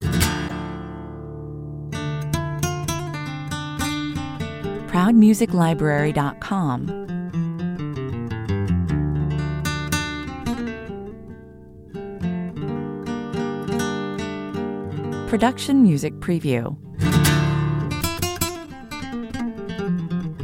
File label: flamenco